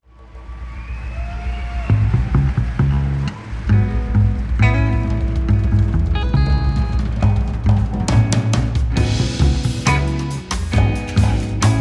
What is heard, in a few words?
trumpet